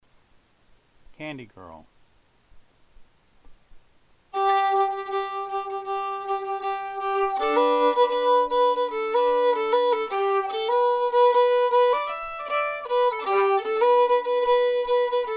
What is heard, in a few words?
fiddle; Candy Girl